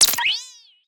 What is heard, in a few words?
SPARKLY SHORT GLITCH; PMSFX; Glitchy Circuits